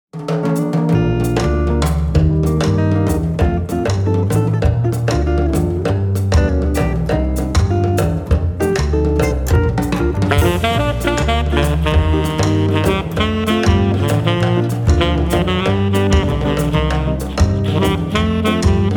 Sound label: Jazz instrumental quartet Calypso